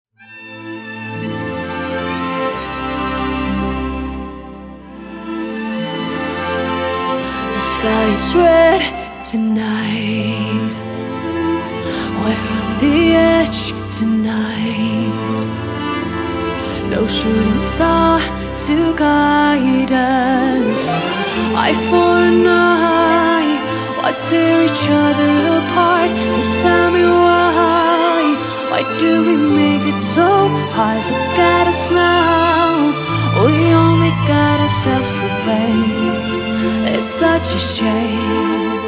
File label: symphonic version